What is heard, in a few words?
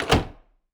Hinged Door Close; d4dj groovy mix; android